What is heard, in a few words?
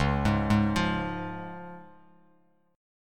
C sharp Major, Bass Standard; arpeggiated; JGuitar